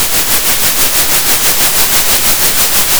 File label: center, Sound of a train